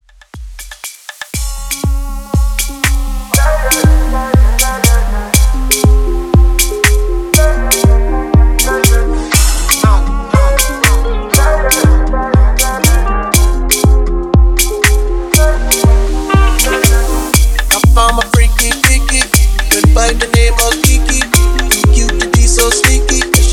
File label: Afro House Upbeat 120 BPM